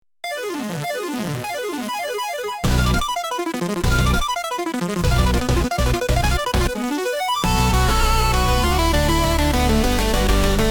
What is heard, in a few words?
chiptune boss fight music